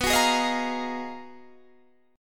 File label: Ab Minor 13th, B, guitar chord